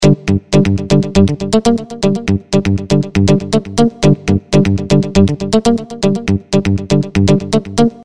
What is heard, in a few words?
rhythm loop funk dance